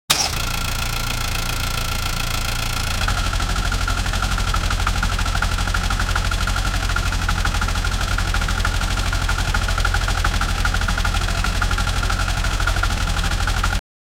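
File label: porsche cayman engine idle; automotive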